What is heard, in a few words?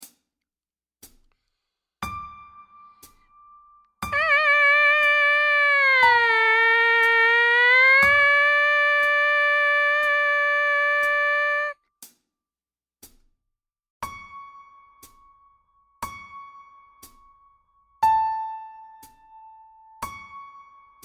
Mpc buzz line